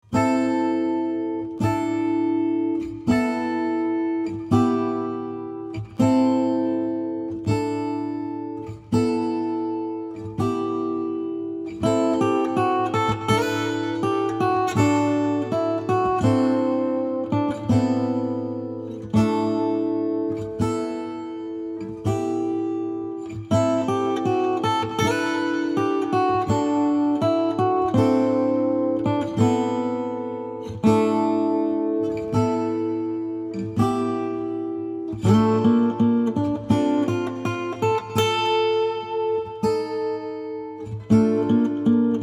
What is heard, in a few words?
Acoustic